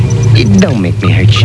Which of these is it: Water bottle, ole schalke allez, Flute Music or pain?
pain